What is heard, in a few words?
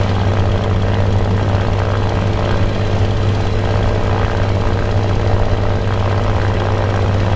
me109 cockpit, engines; War Thunder